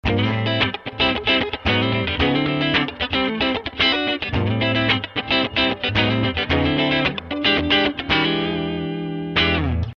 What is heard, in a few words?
Clean Les Paul; compressor pedal; Tangerine Squeeze